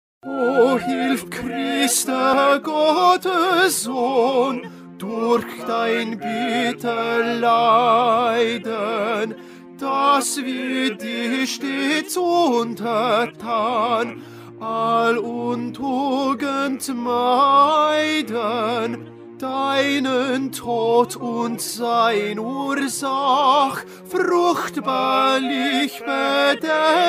O hilf Christe Tenor

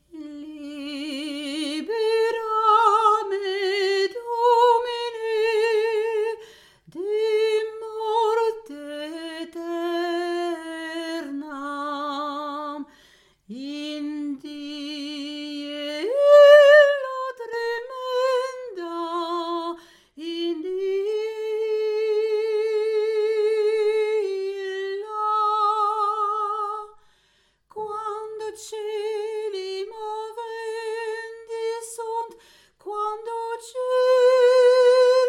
libera me solo

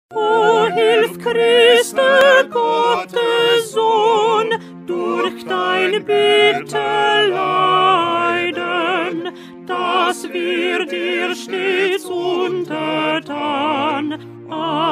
O hilf Christe Alto

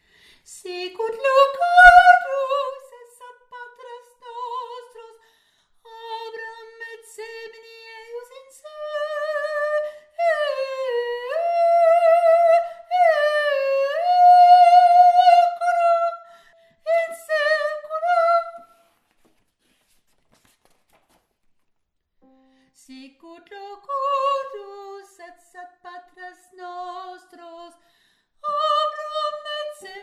sicut locutus est voix

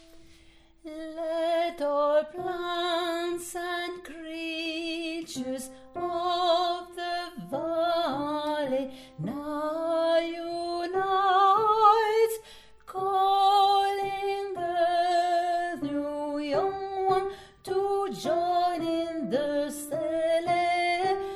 lullabye soprano

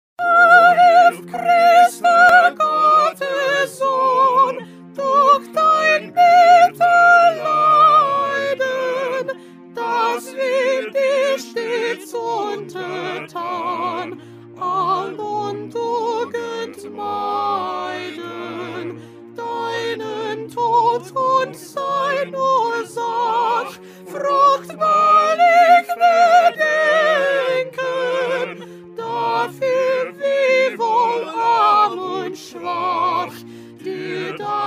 O hilf Christe Soprano

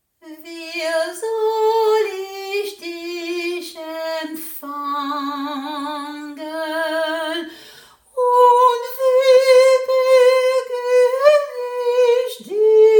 wir soll soprano

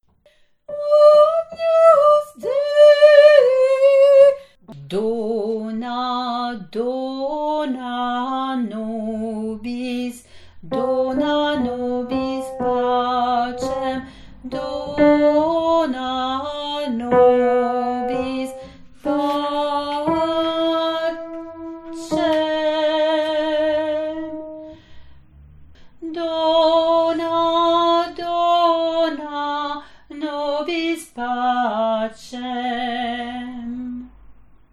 agnus dei voix

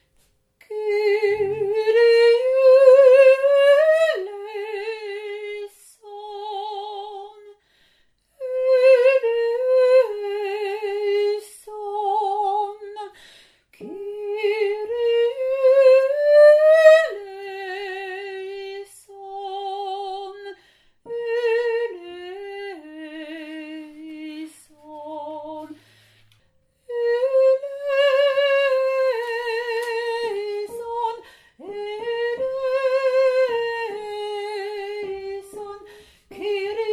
kyrie voix1

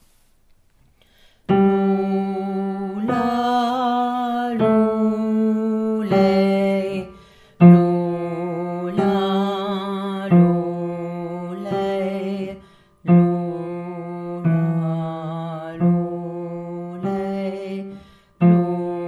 Lullabye Basse

